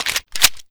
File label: usp mag in; svencoop; weapons